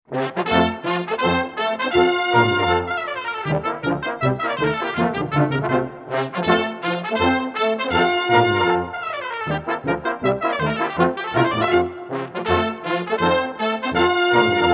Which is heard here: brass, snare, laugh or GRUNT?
brass